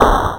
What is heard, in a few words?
Explosion; Megaplane